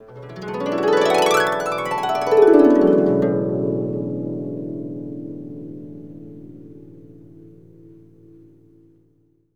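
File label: harp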